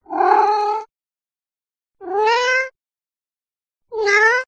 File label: Cat; audio hero